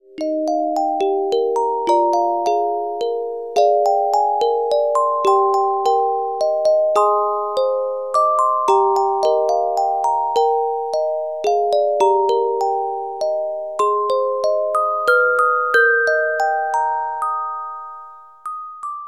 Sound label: Carillon Music Box Melodies